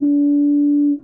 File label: Horns